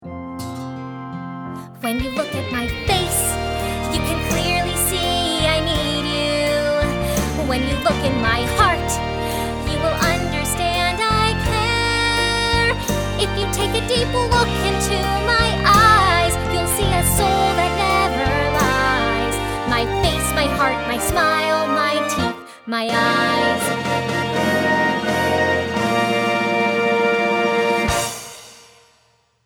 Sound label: vocal